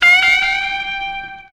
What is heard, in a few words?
temper tantrum